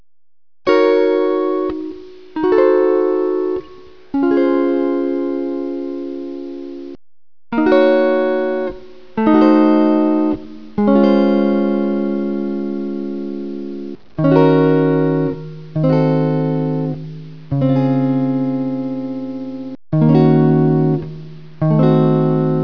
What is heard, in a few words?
Multi minor